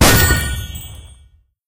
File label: Magic Box Explode; Sfx - Magic Box Explode 01